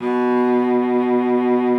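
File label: B2, cello vibrato; Xsample VOL-7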